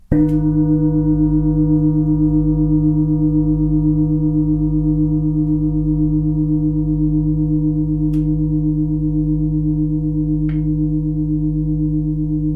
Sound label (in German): fussklangschale